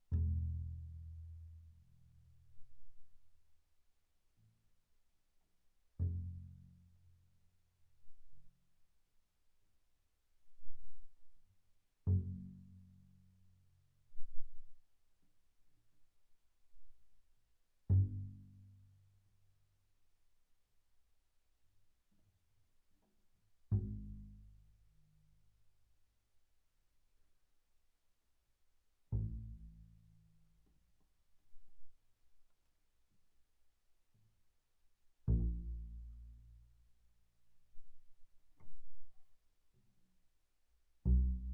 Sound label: Bass pizz sul E pp E1 B1, double bass